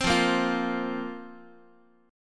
F Suspended 4th Guitar, chord; Fsus4 strummed; x,x,3,3,2,4; JGuitar

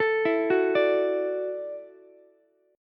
E 7th Suspended 2nd Suspended 4th, down, Ukulele; E7sus2sus4 arpeggiated; JGuitar

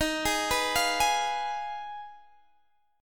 B 6th Flat 5th E flat, guitar chord

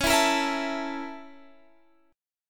Bb Minor Major 7th Flat 5th

